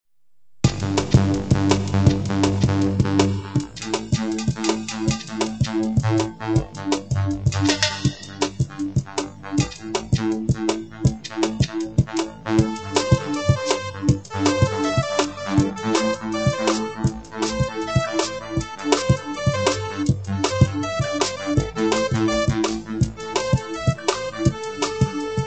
batidinha dance

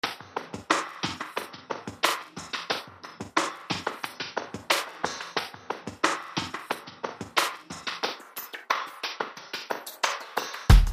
timbre de bateria

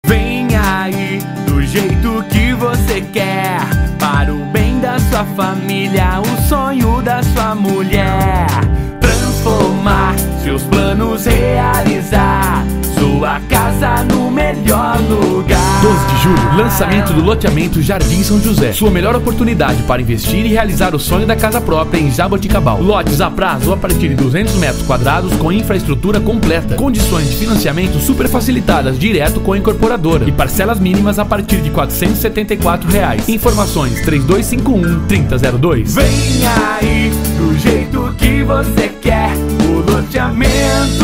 jingles

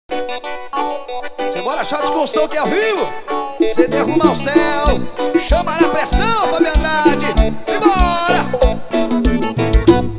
xote

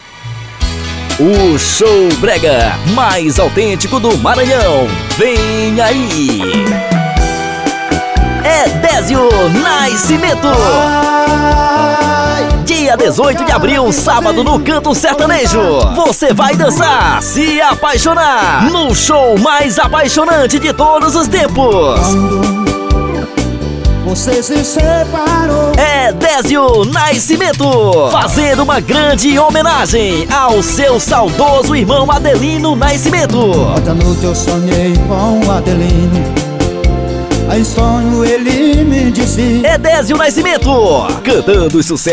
chamada canto sertanejo

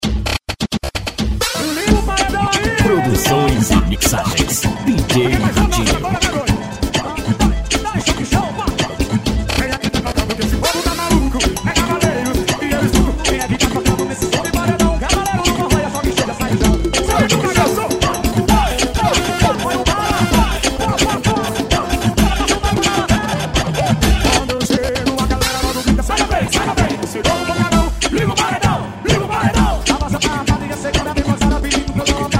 funk com forro som do paredao

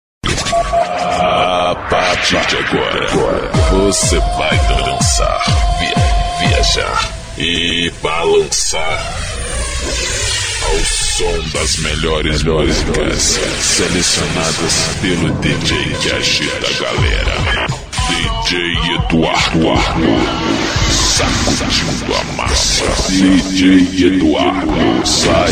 pancadão rap gospel vinheta

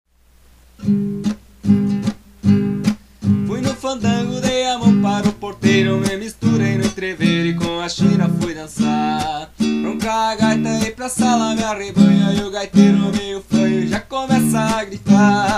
fandango de chao

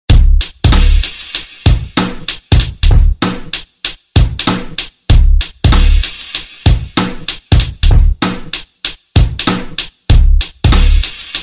instrumental

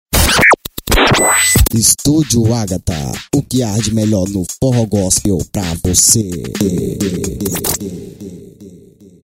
vinheta estúdio

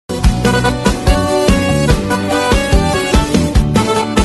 arrocha

